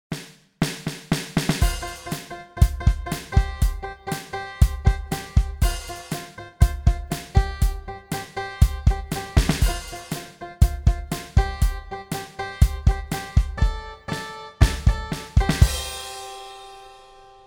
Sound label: Beat